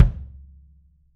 KICK, 3D Drums; AXIS KICK

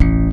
bass 3 (E) brite; Sonic Foundry (Sony Creative Software); Ugly Remnants VOL-2; Basstuff

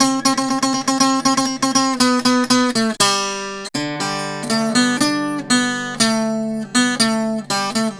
Tenor Guitar, Appalachian

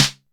snare classic

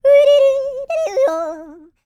YODEL; ILIO; Vocal Planet

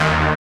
SYN, DANCE SYNTH

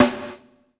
HIT VERB, PROC SNRS, Drumworks; Sampleheads; New York City Drumworks VOL-1

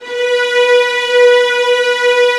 VIOLINS C#, Synclavier Strings; ILIO

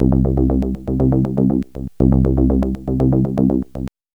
moog seq; AMG - Vince Clarke Lucky Bastard

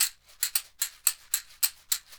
CAXIXI

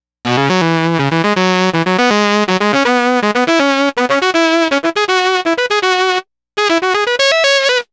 Sax Line; Dr. Fink's Funk Factory

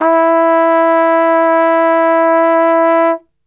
E 2, Brass; Best Service; Brass Super Section

